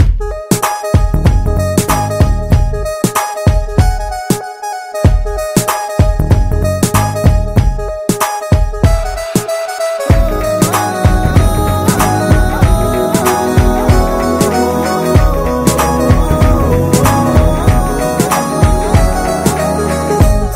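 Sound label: background Music Telugu Ringtone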